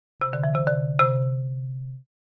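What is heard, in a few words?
Marimba Short Finals; mallets marimba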